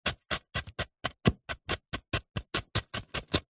carrot slices dice cut chop fast with knife on wooden chopping board